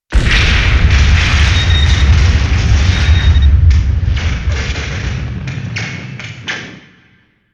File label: hull hit; explosions; TOS